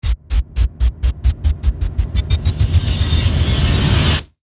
Crescendo Chopper, Production, Element, Crescendo, Chopper, Airy; Crescendos; Blast Wave